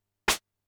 snare, Drum Machines, Formanta Radio Plant Polivoks